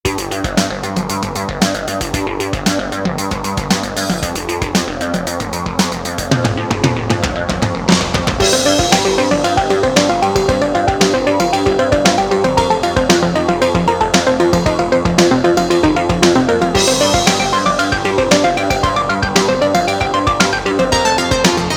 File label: Outterspace, alarms